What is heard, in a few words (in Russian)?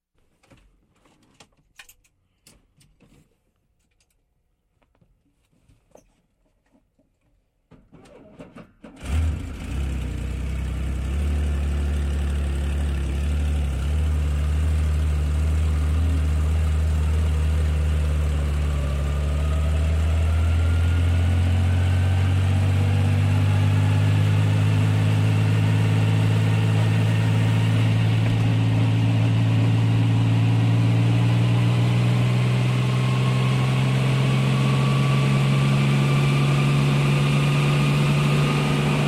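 вертолёт запускается и взлетает восприятие из самолета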